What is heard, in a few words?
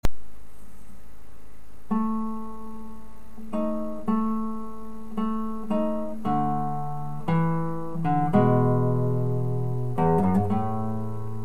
tango